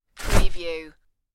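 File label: Umbrella Open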